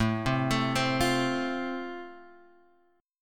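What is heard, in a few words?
A Minor Sharp 5th, Guitar Open G, chord strum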